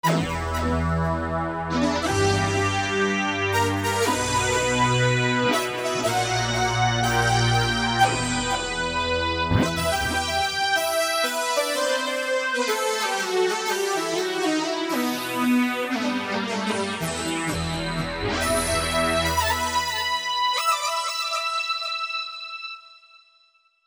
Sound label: Too Analog; Generalmusic Equinox